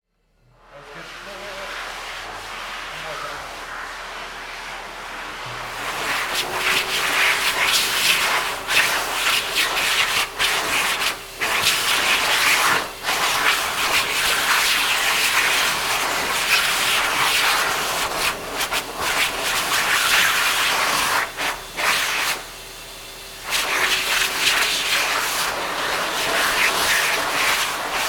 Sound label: high powerd hand dryer